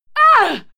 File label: female fight attack hit struggle; schreie; menschen